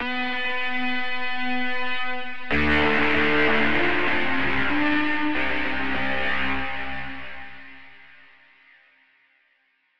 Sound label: Synth Rock Voice